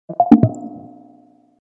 beaming fail

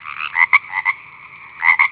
Bullfrog, Nokia 1650 phone tones

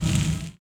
global delete, ui; Vivo iQOO UI 1.5

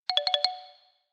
Repeatedly, notifications

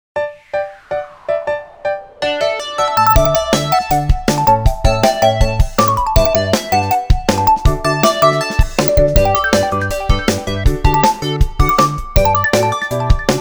ringtong; Gionee V185

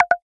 Low Battery; IUNI OS 3

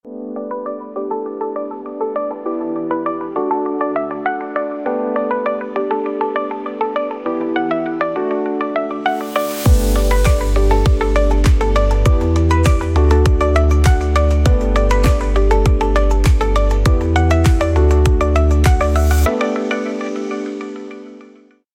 Alien Signal; Tecno Spark 40C

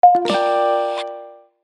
battery charging, phone notification; Rise 54; Orange

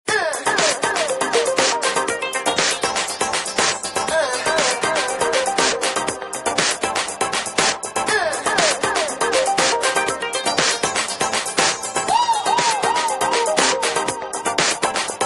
A Turbulent Period, Ringtones Rhythm Mix; Samsung SCH-W420

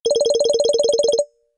A Mellow Pulse, alarms; Tecno Phantom V Fold

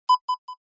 Chirp, notifications; Tecno Pova 7

